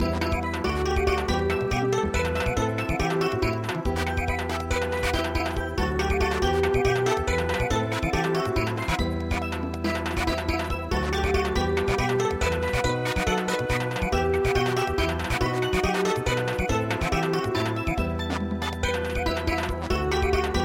Garba Dance Song Samba

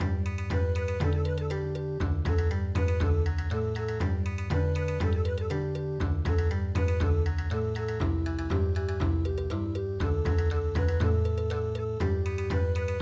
noue bon

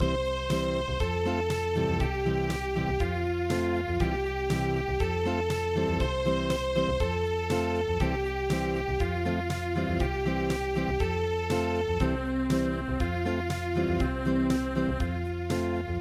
Unplugged

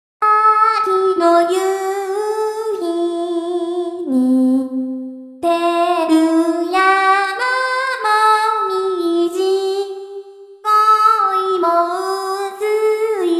maple voice, traditional vocal

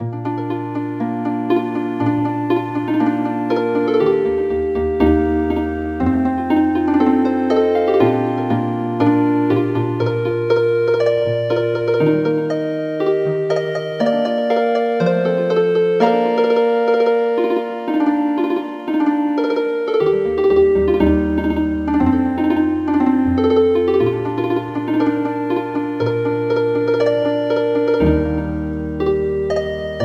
Healin Harp

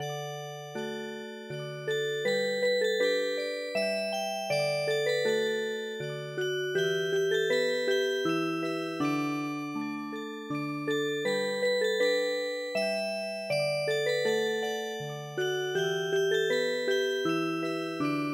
Music Box, midi; Schlaf ein mein Blond-Engelein

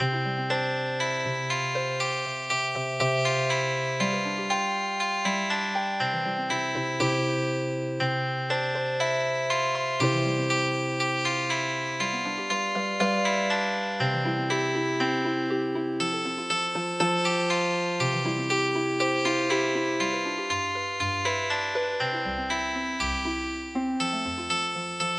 Healing Harp

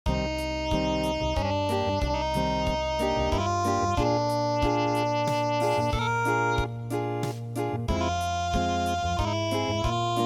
Unplugged; English Dream World

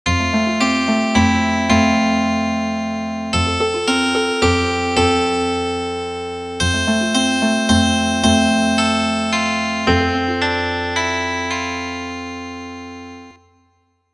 Harp

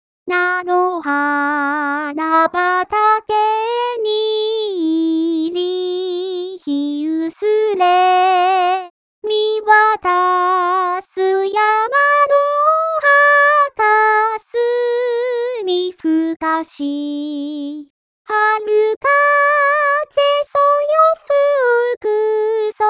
vocal only

